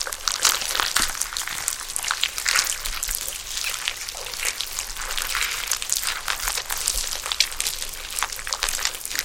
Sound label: loop plant grow